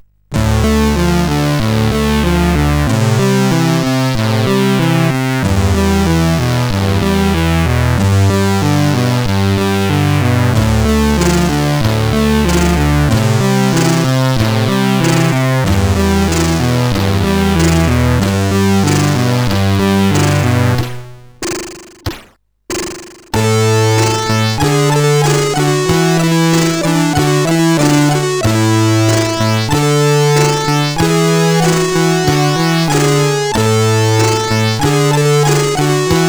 Blues, sid CSG8580R5

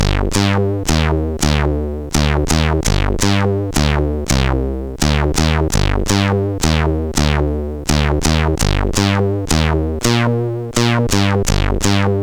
Bass, CSG8580R5; HVSC; Bass 2

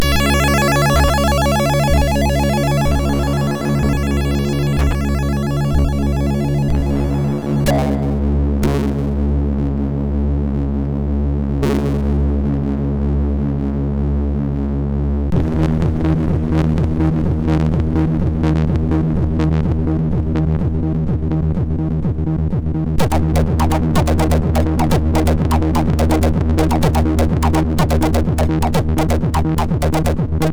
Slide Me, sid MOS6581R2

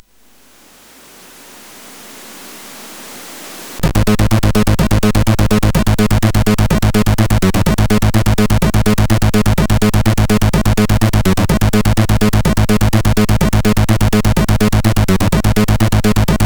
Trance, sid MOS6581

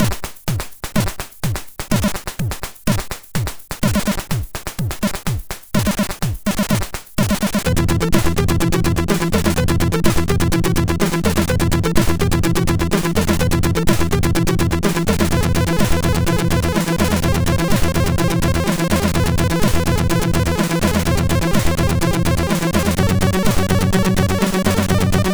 Short Techno sid MOS6581

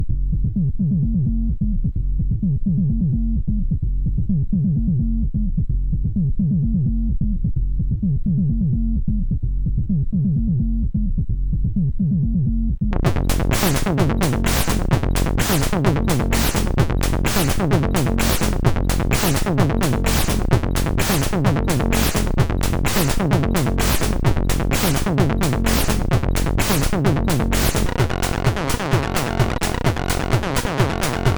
Plasma303, MOS6581R4

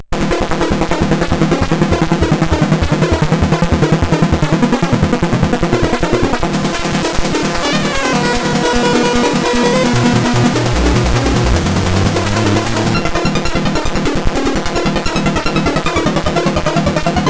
Some Weird Tune, sid CSG8580R5

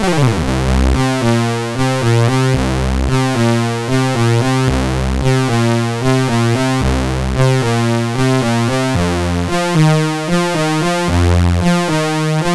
Funk Rock, sid MOS6581R4; hvsc

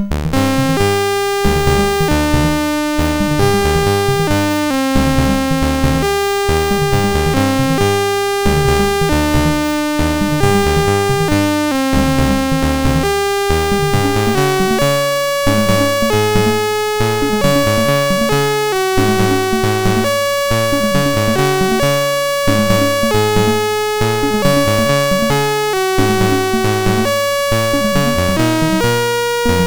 Randomly generated music BASIC, sid MOS6581R2

